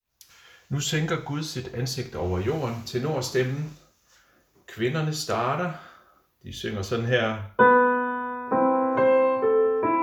tenor, harlev koret; Nu sænker gud sit ansigt

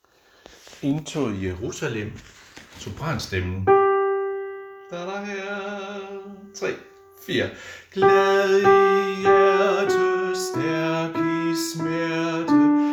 sopran; Indtoget i Jerusalem; harlev koret

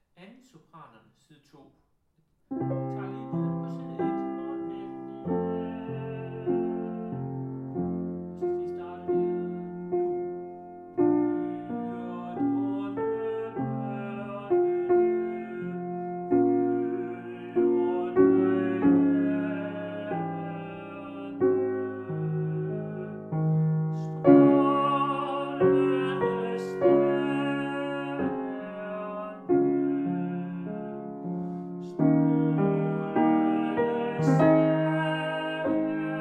sopran; Stjerne på sø og strand

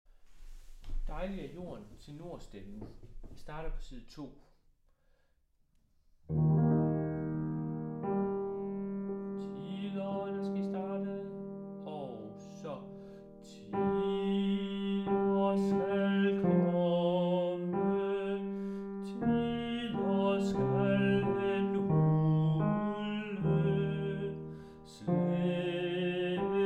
Dejlig er j. tenor; harlev koret

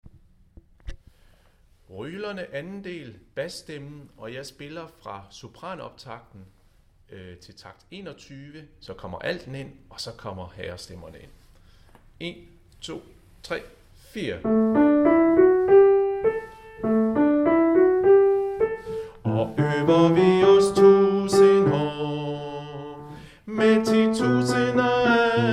bas; Rylerne 2. del; harlev koret

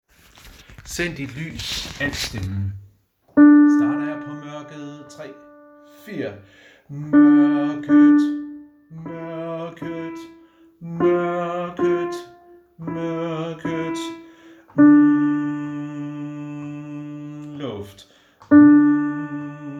alt, harlev koret

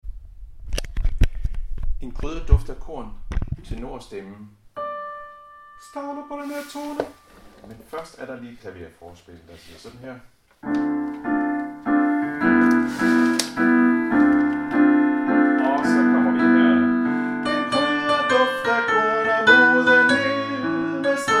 tenor; harlev koret

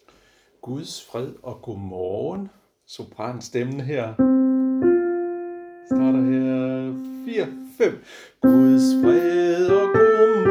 sopran; harlev koret; Guds fred og godmorgen

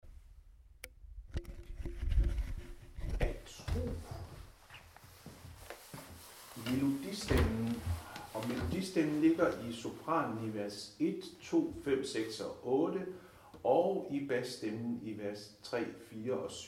melodistemme

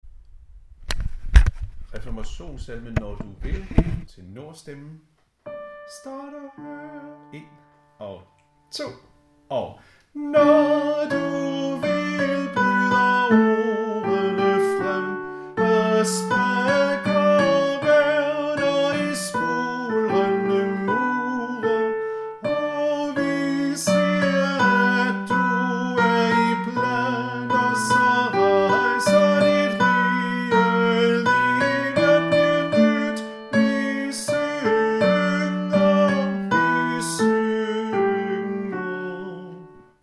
tenor; Reformationssalme; harlev koret

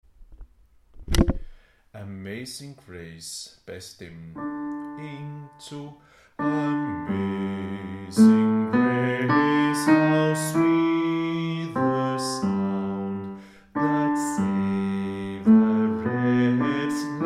bas; Amazing Grace

